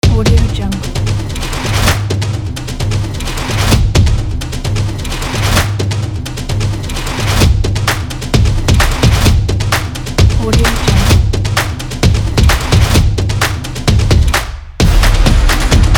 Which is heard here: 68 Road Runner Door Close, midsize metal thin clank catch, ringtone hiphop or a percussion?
a percussion